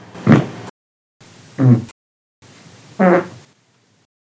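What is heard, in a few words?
fast disgusting fart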